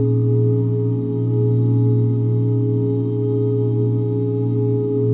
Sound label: OOH A# SUS13, OOH CHORDS, Vocal Jazz; ILIO; Vocal Planet; Jazz & FX